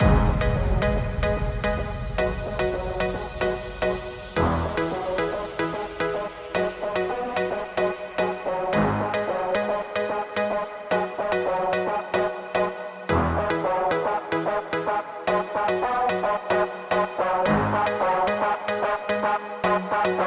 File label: Full Loop